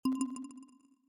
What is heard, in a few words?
tone